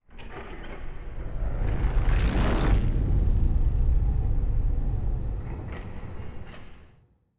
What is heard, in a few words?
ship colossus open; Stellaris; shroud; ships